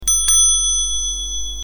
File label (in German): Fahrradklingel Grosse Messingglocke